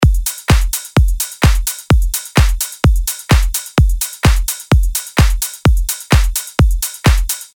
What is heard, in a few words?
drumloop